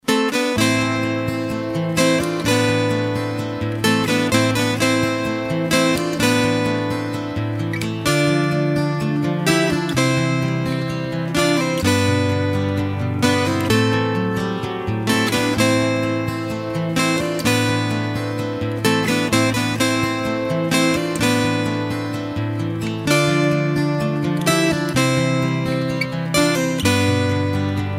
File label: Romantic Guitar